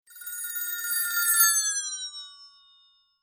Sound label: doppler shifted ringtones iphone